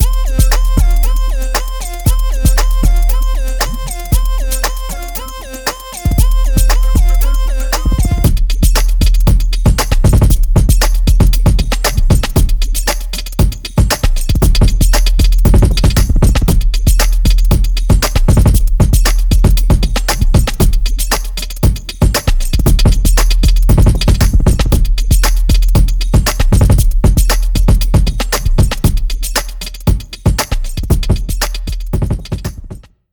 Freestyle Hip-Hop Beat Street Ready Beats

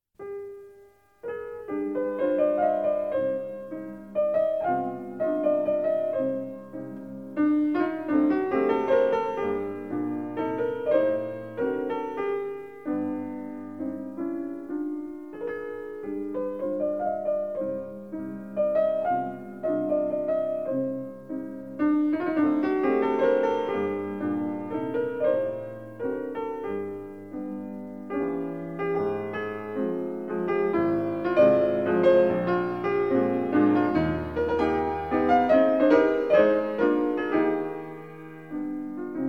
Mazurka c-moll; SHopen

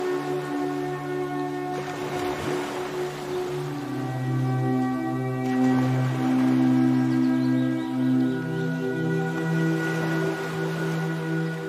Study Music with Wind Ambience

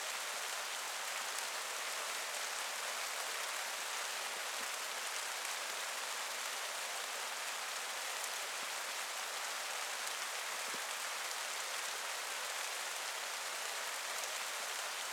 Rain; Summer

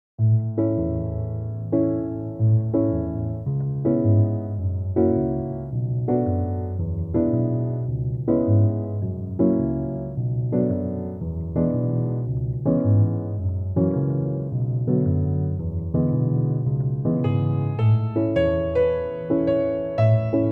Piano Chillout Music

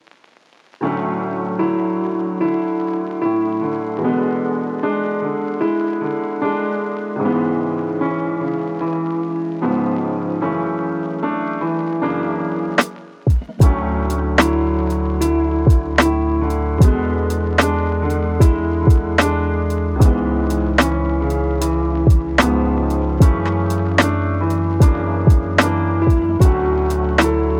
Lofi

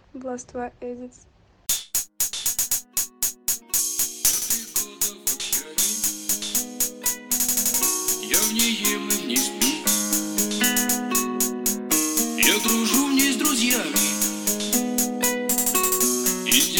trap

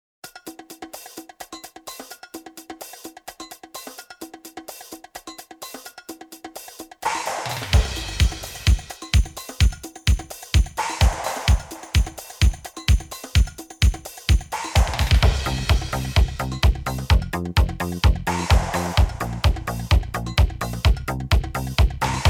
Instrumental

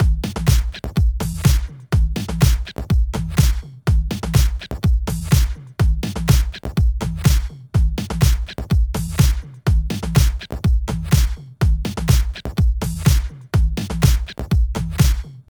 Beat 124 Bpm

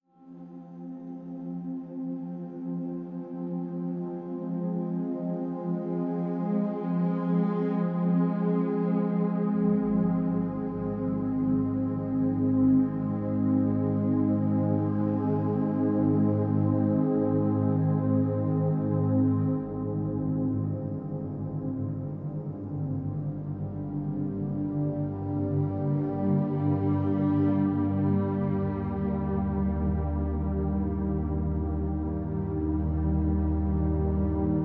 Relaxing Music and Gentle Ambience

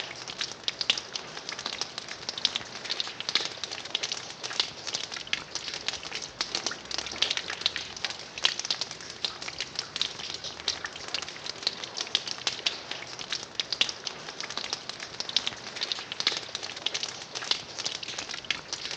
Splashing Rain

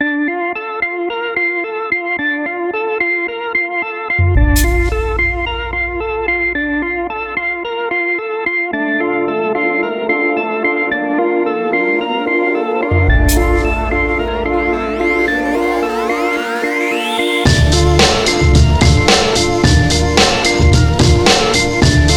Organ

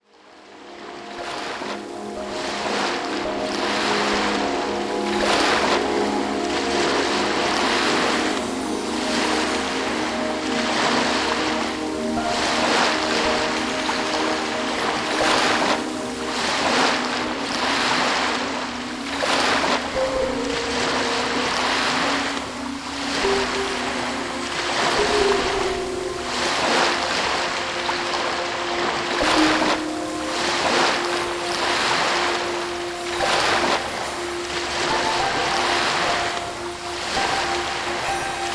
Ocean Waves Sea Sounds

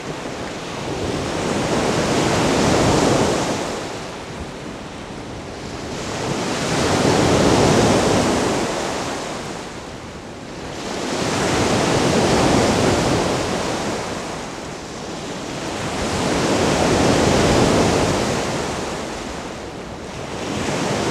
Ocean Waves Medium; Deep Relaxation Meditation; Rain Sound

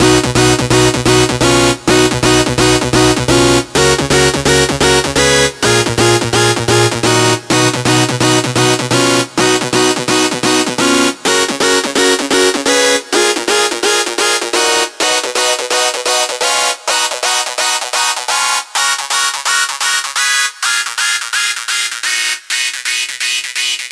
Analogic Bass Leads Res 128

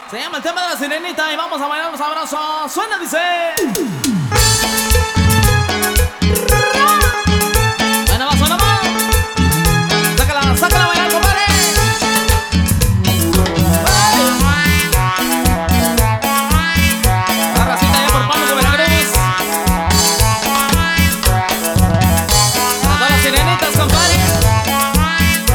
Cumbia

